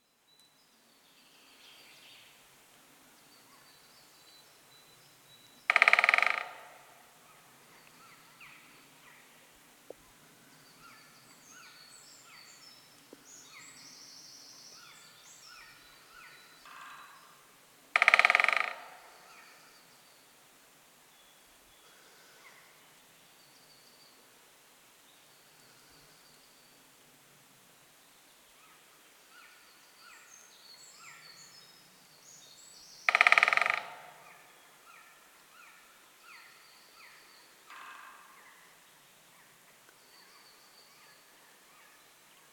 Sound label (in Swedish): större hackspett; fågelljud